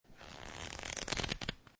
Paper Fanning Book, Quickly fan pages, flick through pages of a book or magazine.; Classroom